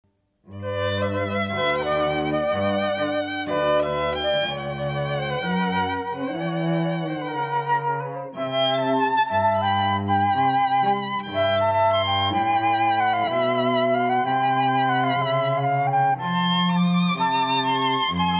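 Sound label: (Flute Trio); Cantilena South Florida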